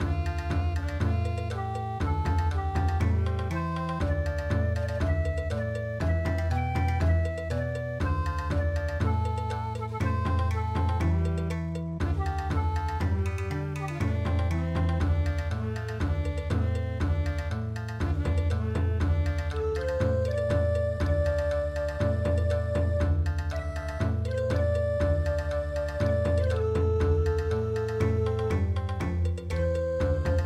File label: yasuki bushi bon